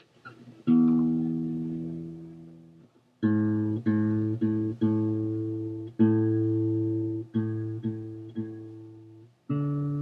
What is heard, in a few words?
Guitar Tuning; Republic Hall Dorm Room, quiet afternoon